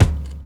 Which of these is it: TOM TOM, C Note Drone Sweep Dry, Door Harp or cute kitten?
TOM TOM